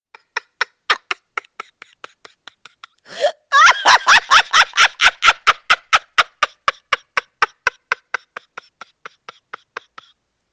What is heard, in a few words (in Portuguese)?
risada